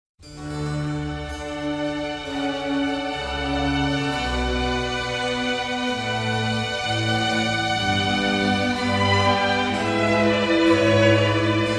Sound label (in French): corde sol; bach